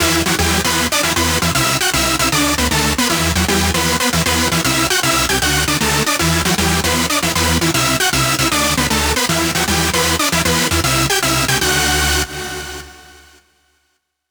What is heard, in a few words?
lead loop Emin 155; Music Loops; GhostHack